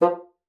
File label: bassoon, F3; Xsample VOL-6